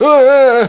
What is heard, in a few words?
scream; wanted